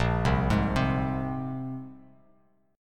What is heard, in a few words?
A sharp 7th Suspended 2nd, Bass Standard; arpeggiated; JGuitar